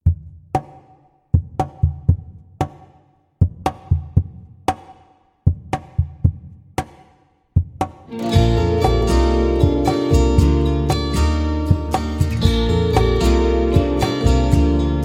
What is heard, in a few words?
acoustic vibes; 432 hz